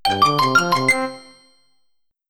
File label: musical reveal